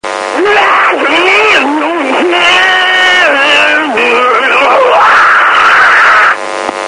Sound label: screamer